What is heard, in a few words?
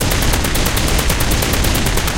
loop oerlikon kda 35mm, guns tanks